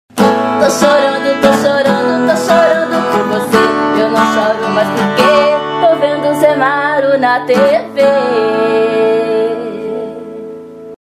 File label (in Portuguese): vinheta cantada